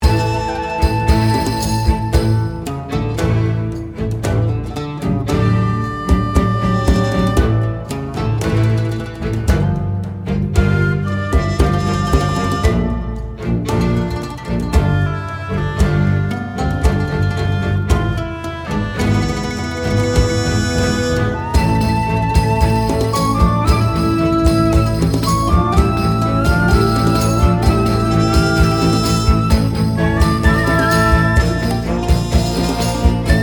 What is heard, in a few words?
Backing; Adult Female